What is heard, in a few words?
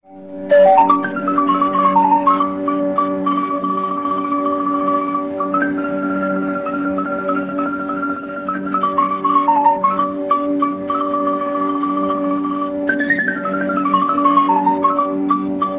klezmer